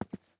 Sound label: footsteps tennis shoes walk; Player Character SFX